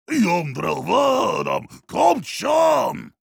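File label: singing, German; Heavy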